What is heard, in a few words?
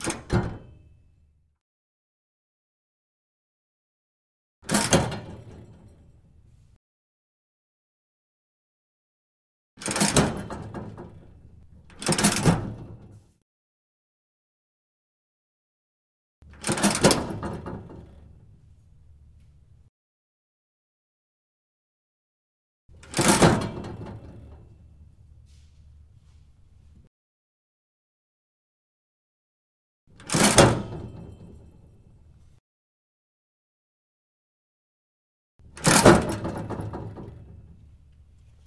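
turnstile sounds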